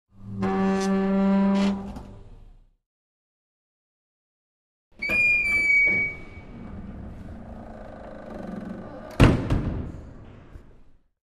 Elevator Door, Creak, Open And Close; hinges creaks

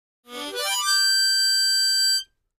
Mouth Organ Glissade, harmonica; woodwinds

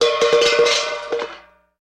Hubcap On Asphalt

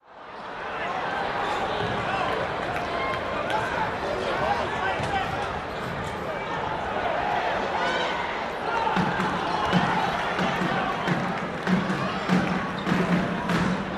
gym crowd cheer, cheers

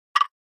Button High Hollow, buttons

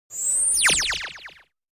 Synth Highpitched To, gun ricochet

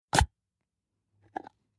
Jam Jar Unscrew Lid, kitchens

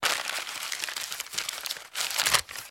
Paper Bag Place Item

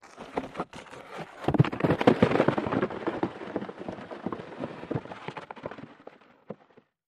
Avalanche, Single Rock Falls

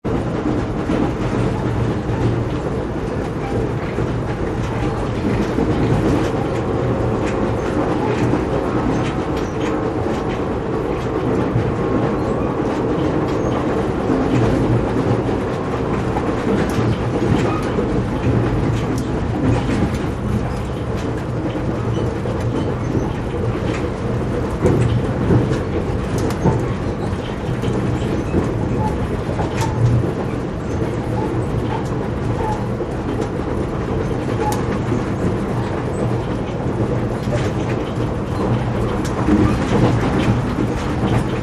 Steam Train Boxcar, steam train

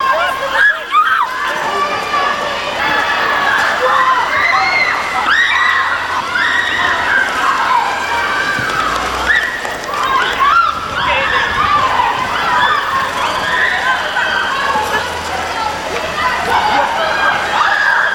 Girls, British, Go Crazy and Scream; screams; Girls, British, Go Crazy and Scream Over Michael Jordan

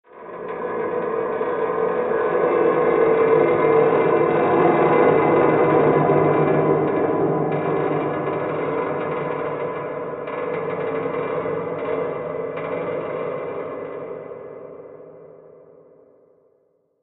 Metal Creeks Eerie, ambience

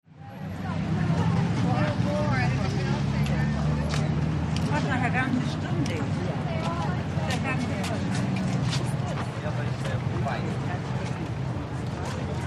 NYC Crowded Sidewalk, big city

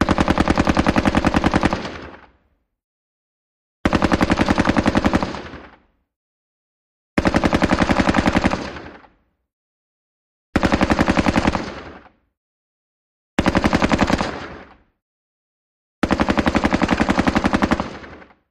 M-60: Long Burst, Medium-distant.

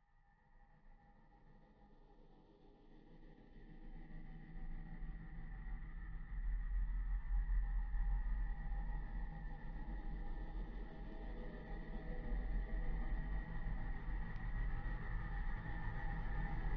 Metal Bow Atmos, bowed metal

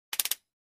Flash Gear Four Quick, flash gear

